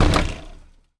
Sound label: Street Ball Glass Basket; SFX Kit; Street Basketball